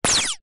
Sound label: Lazer Gun